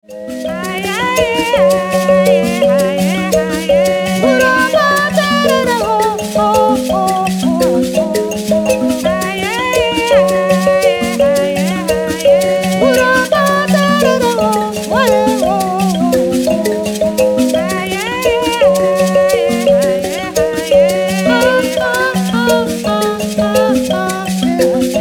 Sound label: mbira